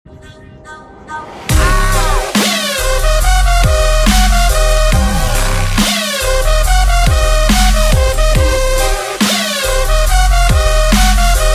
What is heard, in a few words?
dubstep, ringtones electronic